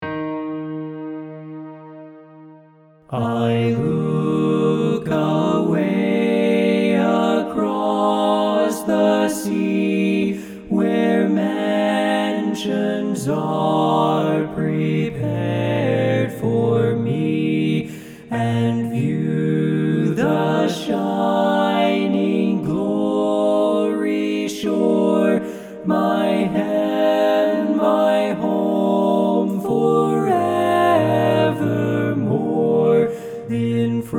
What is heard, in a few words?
ALTO